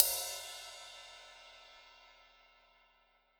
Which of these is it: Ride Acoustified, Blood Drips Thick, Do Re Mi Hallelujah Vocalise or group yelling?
Ride Acoustified